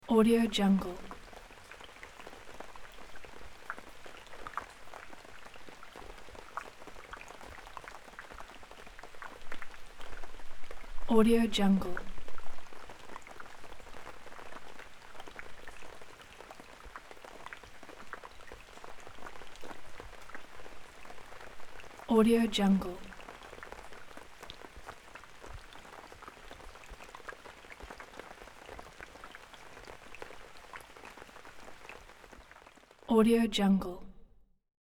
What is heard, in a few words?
rain fall calm bubbles; بارش باران آرامش بخش; nature sounds